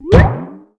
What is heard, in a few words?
King Slime Stand; Seer; GamigoNA